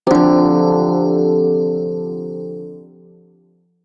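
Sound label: Prime Shawzin Minor Chord D; Music; Warframe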